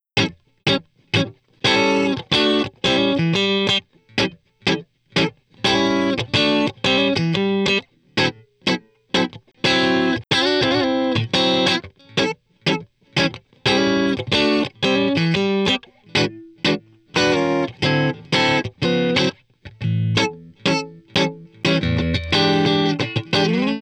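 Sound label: Blues Guitar; Digidesign; Pro Tools Essential Loops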